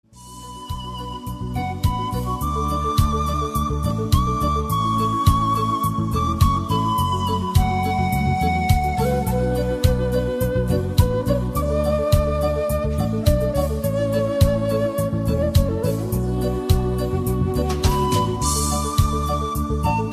Ocarina

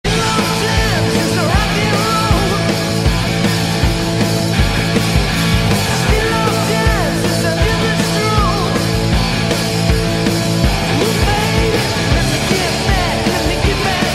Rock and Roll

